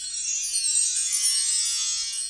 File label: spinner; Paint 'n Play Pony; Crayola